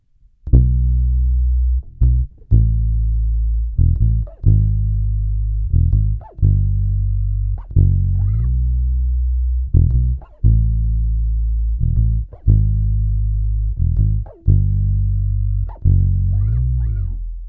plus basse etouffee; schecter extreme 4

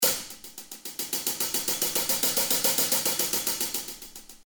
hh velocite; toontrack; ezdrummer

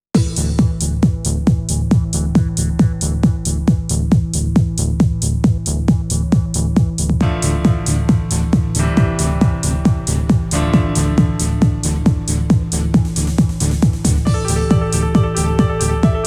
son mix combi dream; korg M50 61; workstation

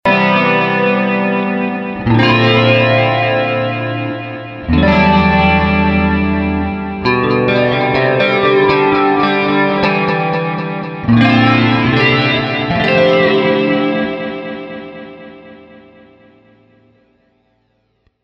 guitare clean flanger; FX Story le flanger

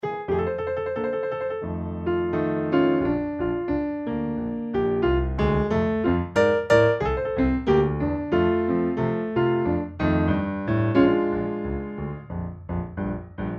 bosendorfer, piano acoustique virtuel; best service; Galaxy II Grand Piano Collection; galaxy piano 2

